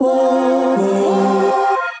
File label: Transformed Voices; Voxy Lady; Voice Box; Chromatic Voices